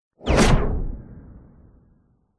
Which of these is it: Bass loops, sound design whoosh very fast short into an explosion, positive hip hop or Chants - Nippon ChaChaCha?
sound design whoosh very fast short into an explosion